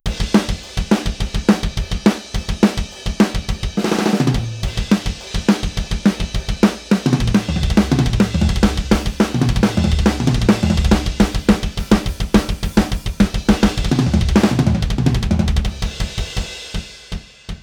hardcore

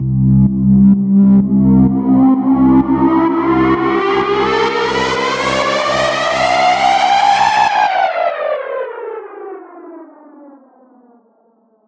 4 bars, horror

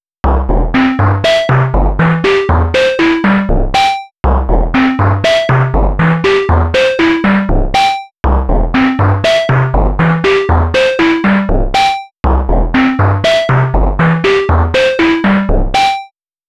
colorful noise, chiptune noise

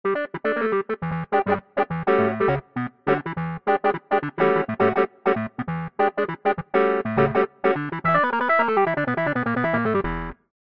clavi-synth

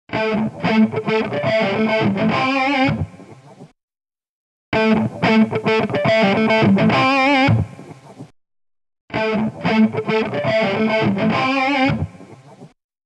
H910 dual harmonizer

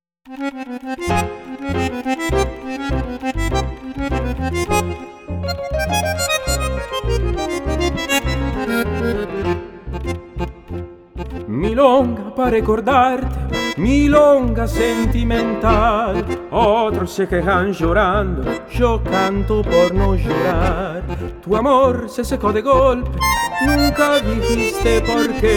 milonga

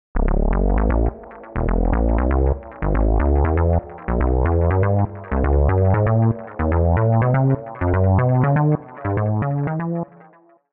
moog rhythm seq

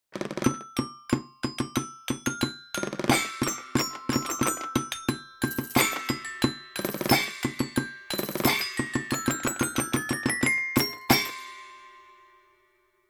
toy perc

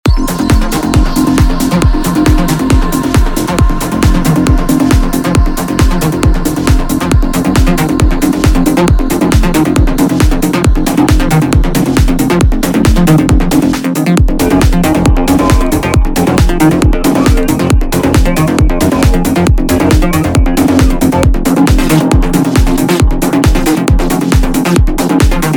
bass; luscious bass soundset

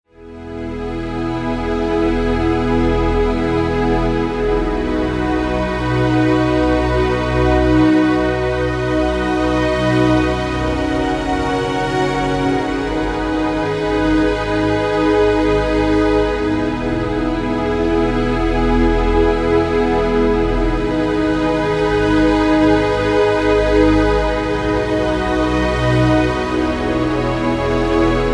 strings

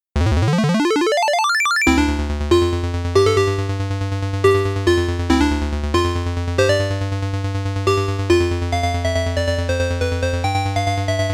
chip blip

